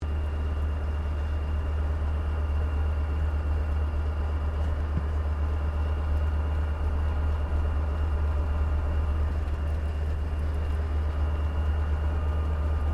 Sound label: Aircraft 1956 CAC CA-25 Winjeel Taxi Interior, WW2 era Australian training aircraft; Airplanes